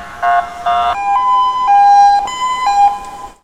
horn police mix, street traffic; best service Blue Box

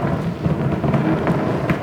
Scrape Chair Slide Wood Loop; SFX Kit; Foley - Misc

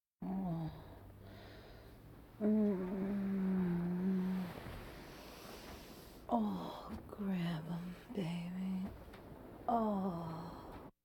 Vocal effects breathing Moaning Baby

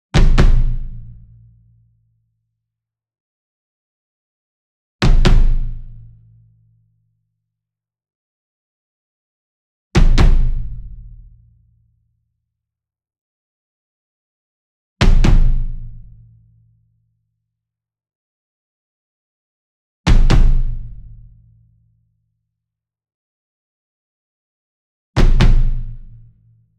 ENSEMBLE DRUM Bass Drum Marching Double Near; boom library; Cinematic Strikes Construction Kit

